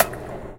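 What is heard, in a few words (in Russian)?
тяжелый удар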